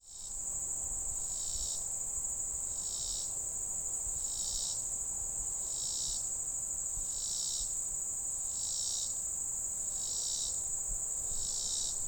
Robinsons Cicada; one of the dozens of other males heard in the vicinity; SGL 249; State Game Lands 249

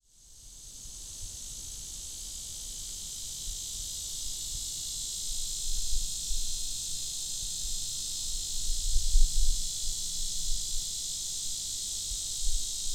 Lyric Cicada; cicadas

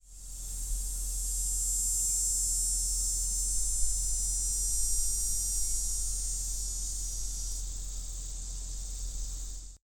Dog day Cicada; cicadas; a sound similar to that made by an angle-grinder power tool

